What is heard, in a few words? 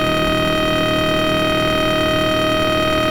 scanner spin